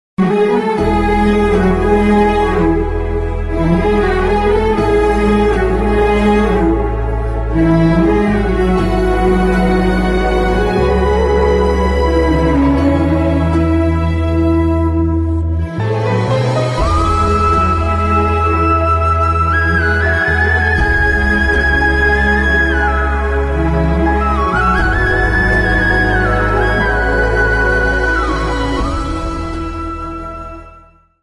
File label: movie ringtone kannada ringtone sad ringtone; mungaru male 2